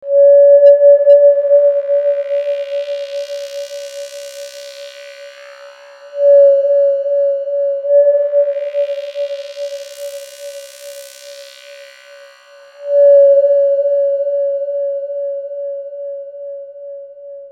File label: eary atmos, synths